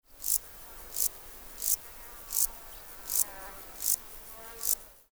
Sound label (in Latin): Euchorthippus elegantulus